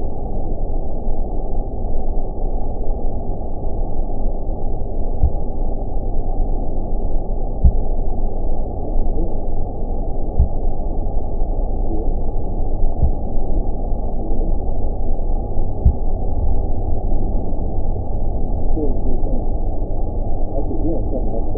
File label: taps